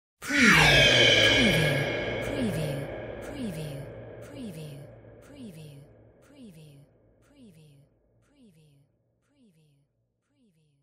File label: sci fi whispers spooky eeyow; windy beach audio